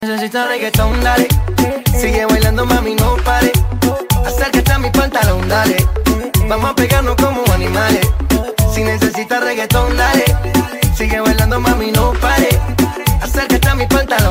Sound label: Ringtone